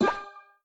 Obstacle Shoebox String Remove

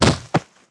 Rattle Snake; Rabbids Multiverse

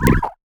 Jump; Chaser

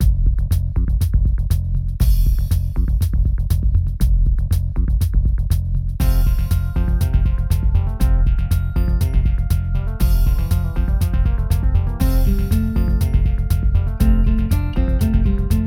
Generic Happy Music; Katawa Shoujo; Enigmatic Box of Sound

